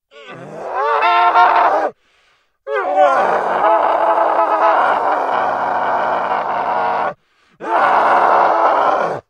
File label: Scream